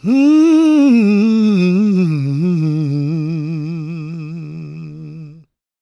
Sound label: GOSP MALE, SOLO; Spectrasonics; Vocal Planet; Gospel